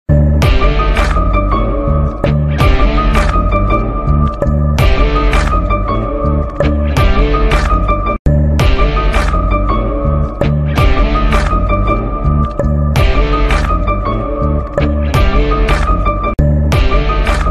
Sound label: bgm ringtone; vikram vedha